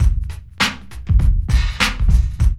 LOOP; E-Lab; X Static Goldmine 2

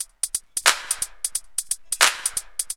LOOP; E-Lab; X Static Goldmine 2; akai

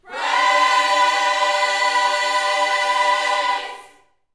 Vocal Gospel PHRASE

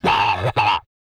GOOFY, LAUGHS, Jazz Fx, Vocal

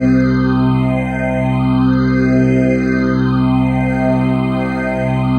PHASE PAD, ATMO PADS; Best Service; XX Large

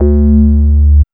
BASS

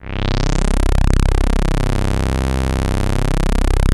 Atmo Pads; Best Service; XX Large

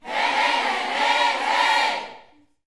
YOUTH CH PHRASE, Vocal Planet Gospel

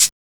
CAB

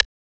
rim

